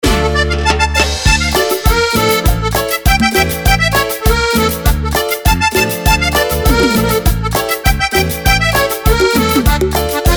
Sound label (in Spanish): Fantasia Cumbia Caliente Sabrosona